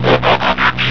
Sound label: transform